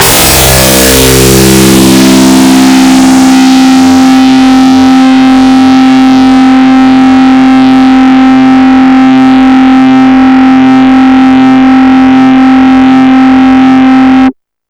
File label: DIST STACK; Big Beat Samples & More; AKAI